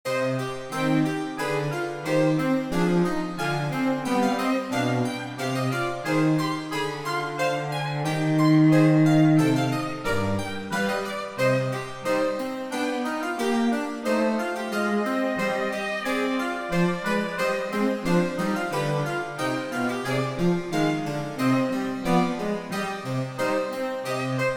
Canon Cancrizans a 3 in C minor for Woodwind and String Trio